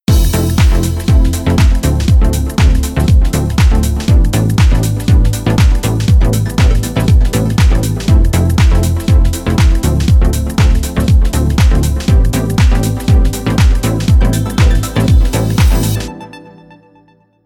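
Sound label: Synth Sequence Arpeggio; Pin Point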